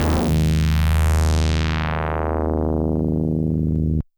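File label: DX100 D#0; rocket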